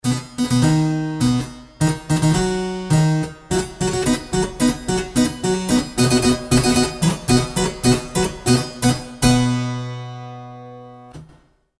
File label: fanfare